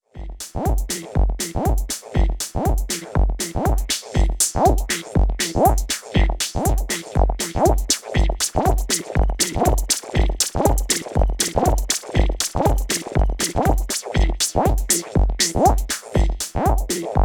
Reloop KUT Phase

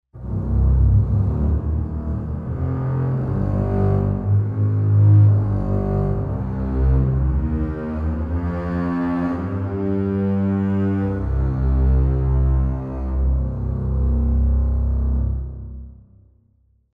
String Ensemble Bass Legato

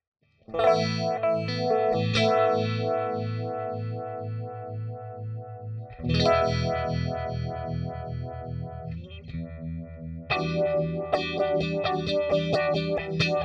Univibe

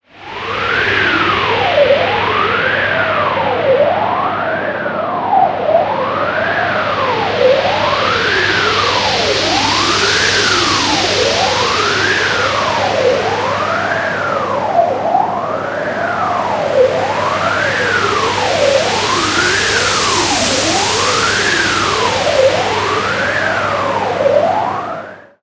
Filter Plug In; Kilohearts

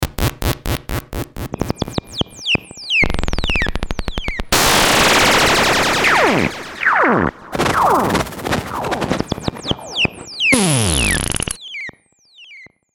LFO

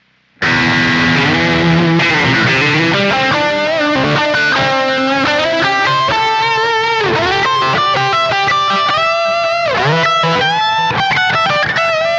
High Gain Lead; Carl Martin Ampster

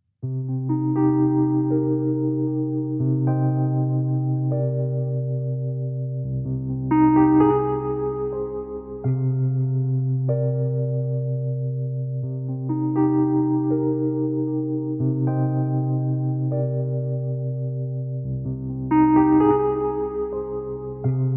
Arturia V Collection X Wurli V3; Magic Pendent

